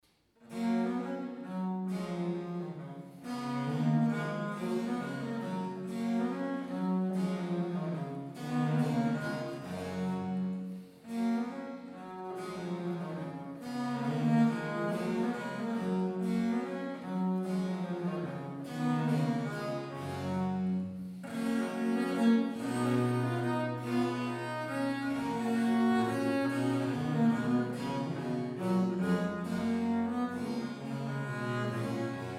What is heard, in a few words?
menuet; boismortier op31